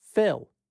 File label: fill